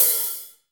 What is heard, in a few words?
HH hh; Best Service Gigapack 2